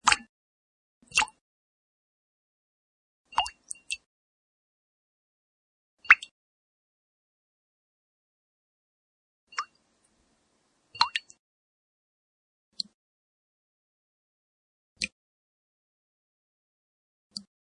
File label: Water Drops; Water dripping